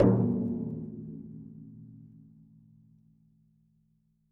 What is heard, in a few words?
Metamembrane 10cm boundary